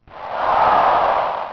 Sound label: crowd; Midway Games; Smash TV